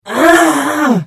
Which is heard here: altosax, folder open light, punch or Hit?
Hit